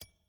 ui explore button hover; spirit blossom

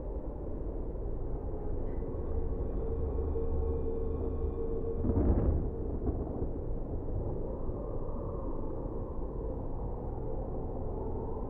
amb graveyard; spirit blossom

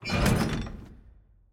Fusebox Enter; Puzzle

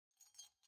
Foley Ponytail

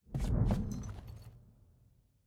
Turret Exit

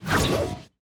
Enemy Attack Lunge Cast; bloom minigame

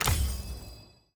UI Click Dialogue; bloom minigame

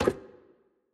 Monkey Stuck Jaw Latch; Puzzle

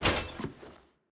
Chomper Kick; Puzzle

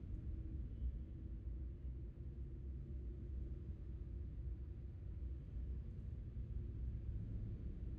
Ambience Shooting Range

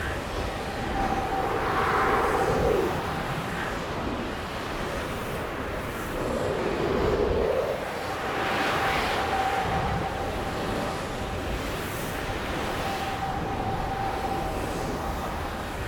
AMB; DEMACIA

